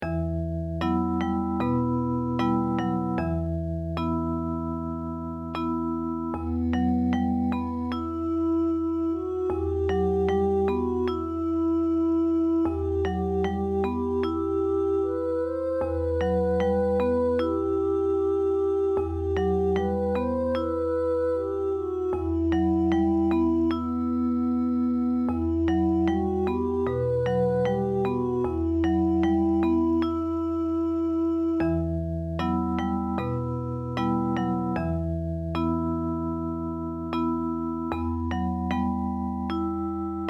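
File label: lullabies vocal & guitar; 4 lullabies; oitachiho no komoriuta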